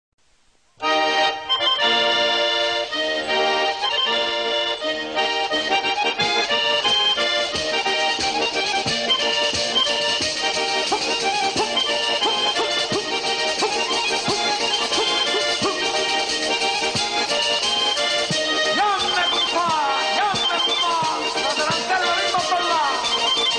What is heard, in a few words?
Tarantella Calabrese